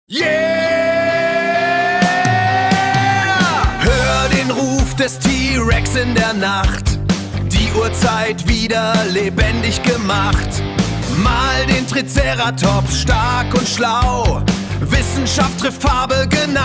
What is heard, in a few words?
Dino Jingle; Dinosaurier